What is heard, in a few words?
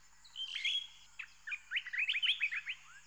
Lake Bird, ambience